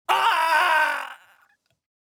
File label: Voices male death grunt